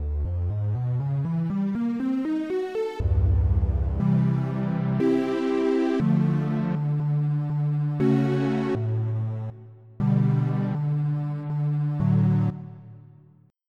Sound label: Pad; FF7